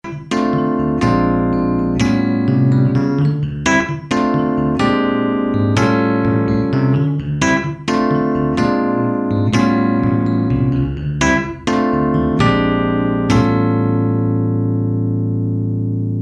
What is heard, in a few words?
dyno clean, fender rhodes